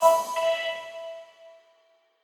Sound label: Quasar Clear B; Mixolumia